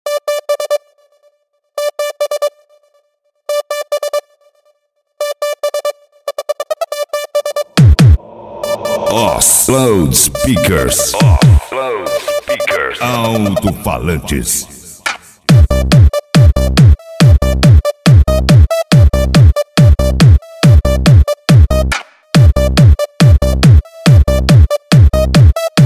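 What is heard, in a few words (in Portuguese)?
alto falantes loudspeakers 5250 w agressive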